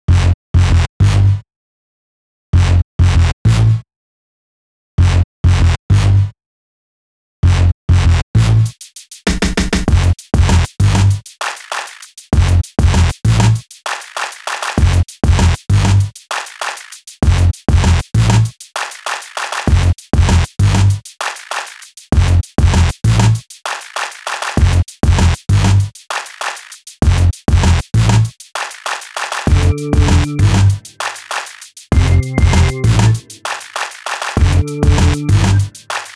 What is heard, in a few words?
Hip Hop